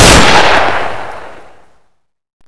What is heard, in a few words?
ak47 real; cstrike; weapons